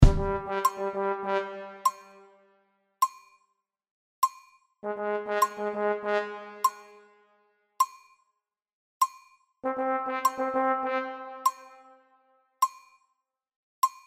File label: break